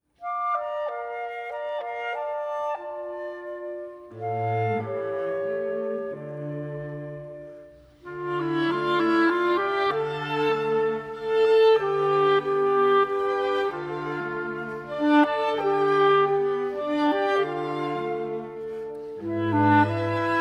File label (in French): instrumental melodie